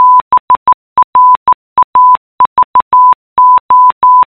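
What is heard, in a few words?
sound "bravo" Morse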